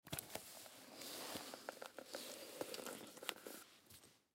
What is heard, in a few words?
Gas Mask Put On; Military; Blastwave; Warfare